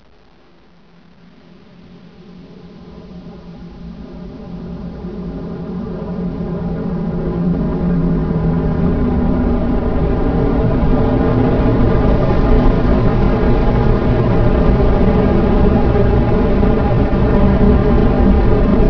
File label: alien flyby; quake2; kitsune